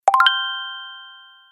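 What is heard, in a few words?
fleeting sounds for SMS bling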